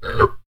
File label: hurt, aliens; shevaar